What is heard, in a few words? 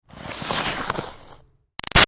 Snow Slide By Icy; Skiing and Snowboarding; Sports; Big Room Sound